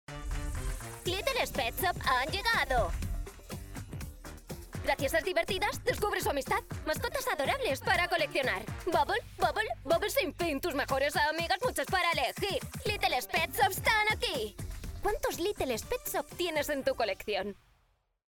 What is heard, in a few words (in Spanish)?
Cancion; BANDAI